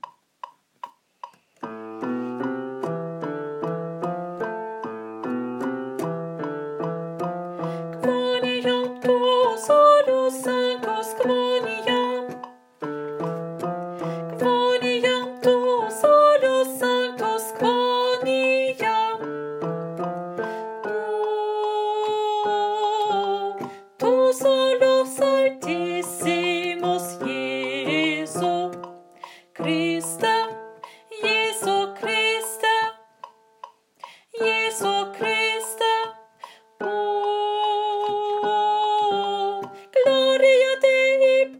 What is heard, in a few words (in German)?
Gloria, Bass; Bass (Teil 3); Messe; Chor